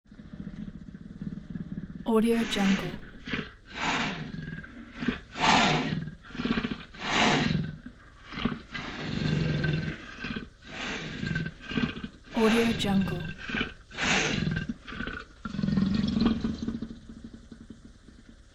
leopard roar

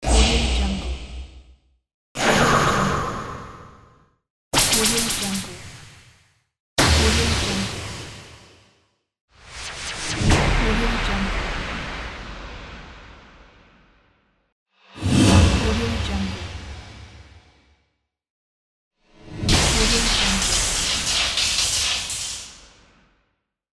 magic attacks

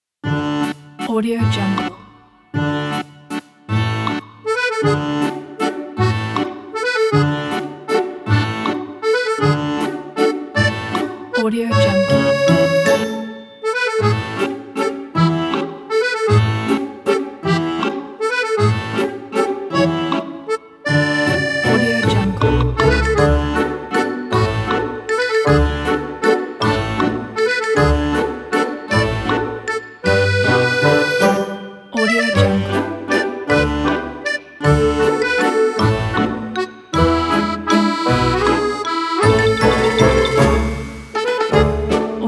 the funny it

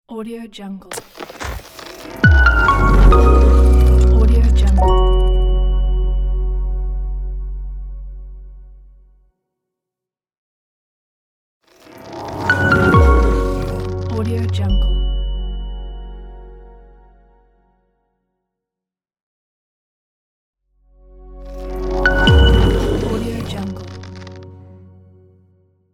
short minimal logo

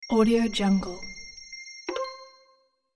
puzzle game wait to match

